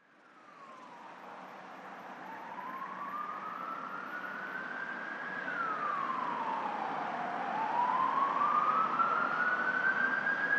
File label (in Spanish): sirena, ambulancia